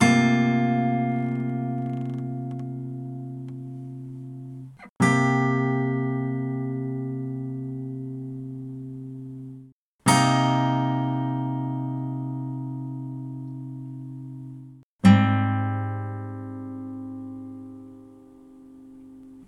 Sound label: Guitar Chords